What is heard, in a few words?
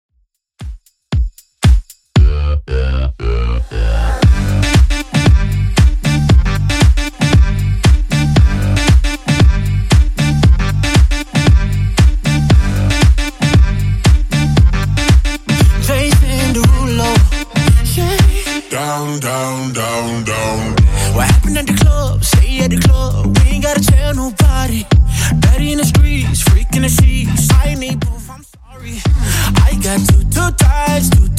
Top40 DJ Friendly Redrum 116bpm